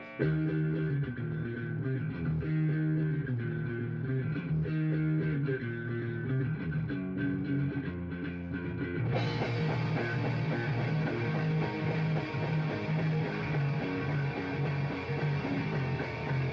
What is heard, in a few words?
who dat less bass